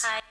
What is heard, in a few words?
Vocal